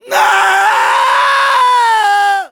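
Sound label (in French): Soldier pain critical death, fr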